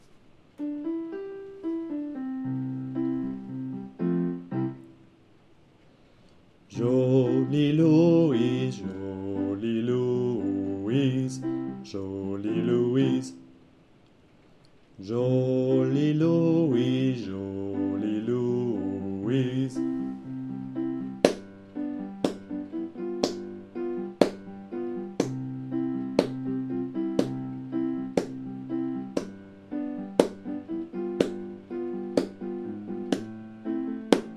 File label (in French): basse